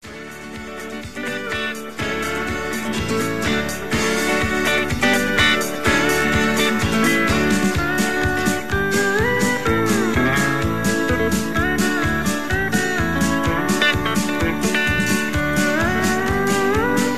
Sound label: Instrumental